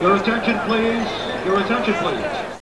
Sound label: Shoop Random Crowd Sound; Intel; Createshare; PC Camera Games